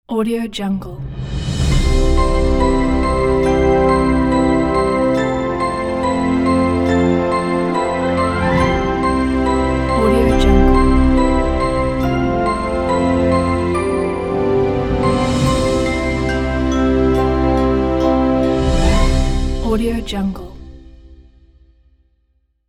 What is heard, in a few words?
peaceful ident